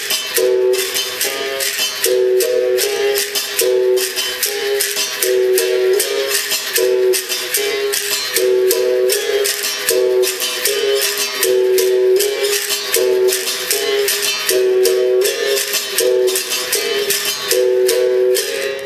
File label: Sao Bento Grande; Regional de Mestre Bimba